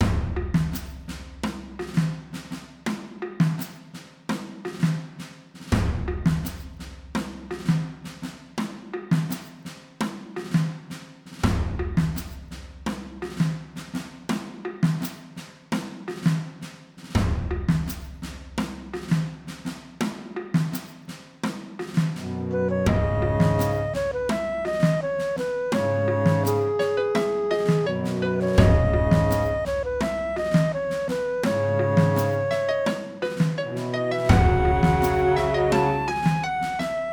Town Music Loop

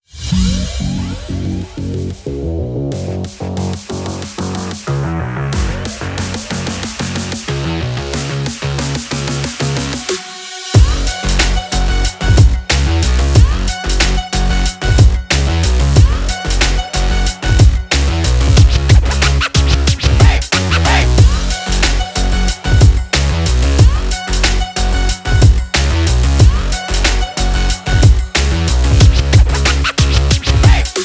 Racing Energy Breakbeat; quick race linux macos ps4 switch windows gamerip 2021

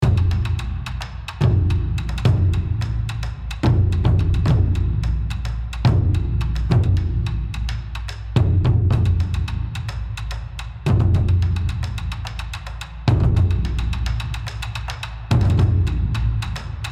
Battle Drums (Loop); B Path of the Teal Lotus

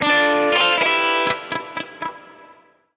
ball out; Western

